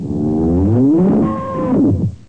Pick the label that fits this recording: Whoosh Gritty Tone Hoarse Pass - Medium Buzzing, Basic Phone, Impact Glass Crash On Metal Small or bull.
bull